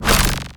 p atk magicsword

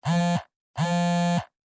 phone vibration

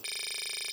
ui tick

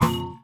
ui apply pause

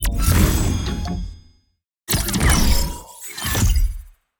ui battle start boss

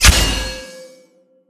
lantern soul sword

